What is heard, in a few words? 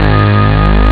roll; Amiga Soundtracker Sample Packs